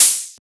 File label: Open Hat; FLPRO